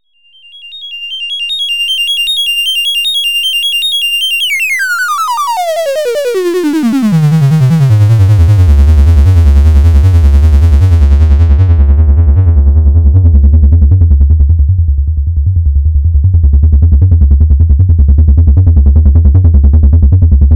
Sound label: Synth